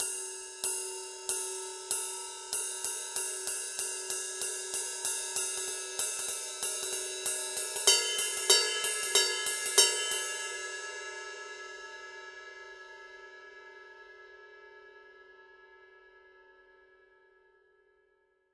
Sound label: paiste pst 5 rock ride 20 pattern